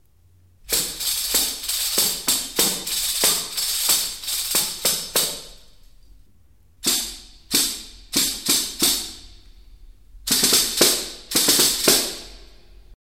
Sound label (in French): tambourin